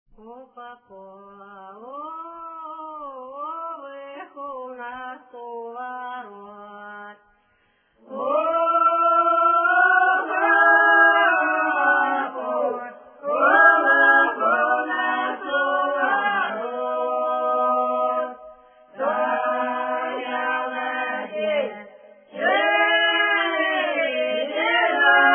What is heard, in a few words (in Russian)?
лирическая, с.Ермолово Касимовского р-на; запевают; Калина с малинушкай